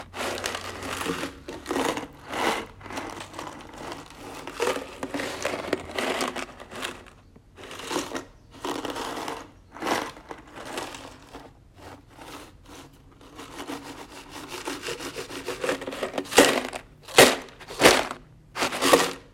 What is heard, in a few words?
Pistachios